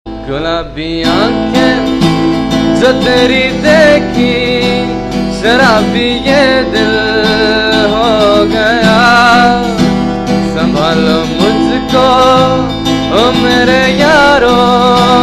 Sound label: unplug, ringtones